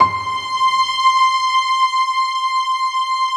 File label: PIA Piano String; Sound & Vision; Gigapack I CD 2